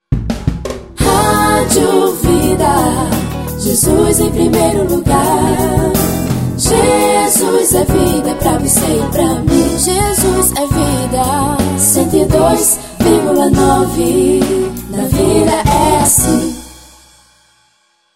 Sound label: jingle rádio